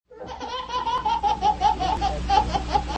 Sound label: laugh ringtone